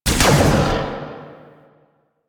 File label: Shot, Spear Gun; BE Ferrox